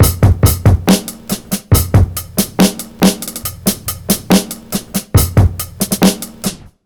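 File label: Old school, drums, dubstep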